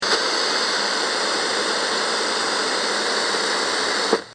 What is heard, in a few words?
Static White Noise Warfare Household, Static White Noise Radio Television Household; Sound Design